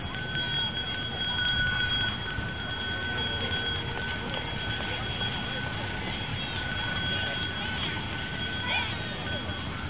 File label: people